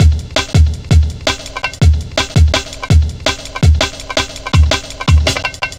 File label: Drum Loops, 165.5, Jungle